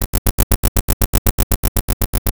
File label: Radio Hit Digital Chopper; Transitional Elements; 1001 Sound Effects